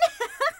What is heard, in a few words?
Laugh